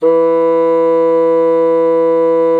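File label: bassoon mezzo E3; Xsample VOL-6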